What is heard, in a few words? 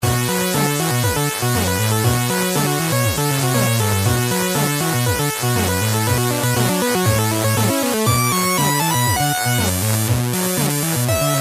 speaker, pc; Turbo Outrun